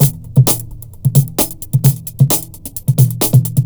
LOOP FX, Big Beat Drumloops

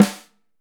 5X14, Drums; Big Fish Audio; Ross Garfield Drums VOL-2

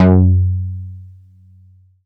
Dance Floor Bass, Multisample Basses

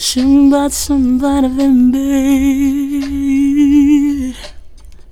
FEMALE, Hip Hop

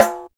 PER Percussion

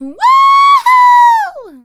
Woo Hoo, Vocal Toolbox, Sound Bites; Sonic Foundry (Sony Creative Software)

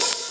KIT, Dance Mega Drums, HIP; Best Service

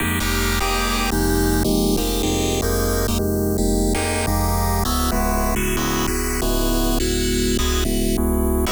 static random chord; pad

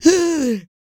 PERC, MALE PERC

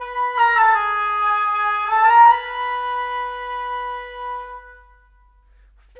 Flute Oboe, Cinematic; Sonic Foundry (Sony Creative Software); Orchestral Series VOL-3 - Cinematic

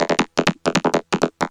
Vocobeat; Sonic Foundry (Sony Creative Software)

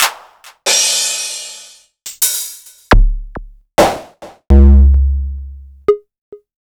Korg Electribe ER-1 Kit

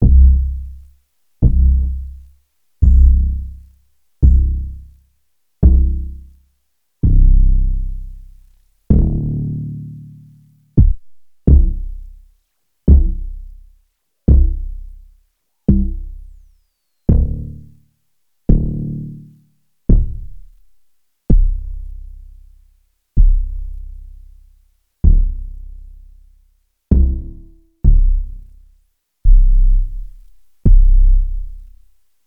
Bass High Quantity

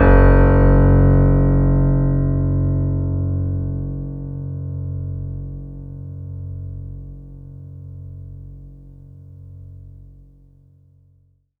F#1, Synth Line

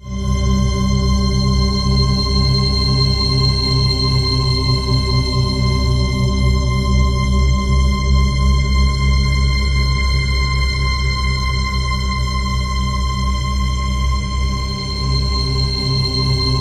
BIG SYNTH, Ominous Drones; SYNTH SND; USB Soundscan vol.48; AKAI

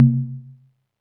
CONG TOM, CONGA BONGO; Best Service ProSamples vol.54; AKAI

